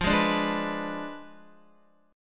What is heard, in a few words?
F Diminished 7th, Guitar; strummed